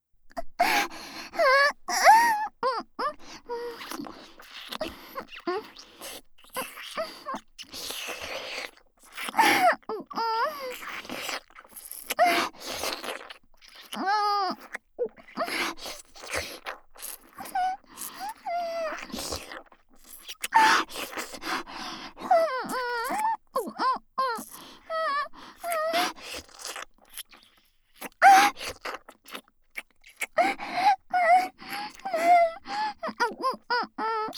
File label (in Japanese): ベロチュー＆本気喘ぎループトラック, 効果音なし, ASMR; 世話好きJK舞花ちゃん キワキワ水着で甘夏えっち♪お泊り海旅館でいちゃらぶ糖度120%な甘くて爽やかスイートサマーバケーション♪